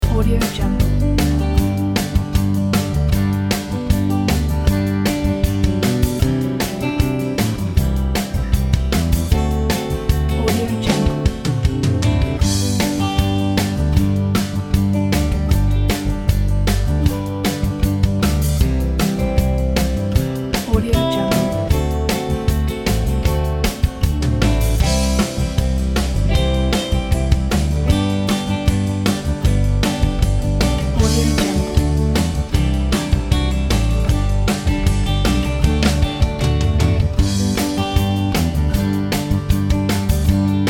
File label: country road america; sonicmood